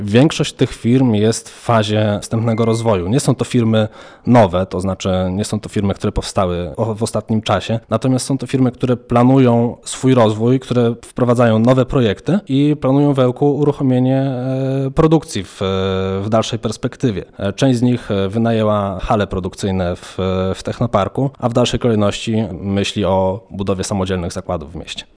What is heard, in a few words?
techno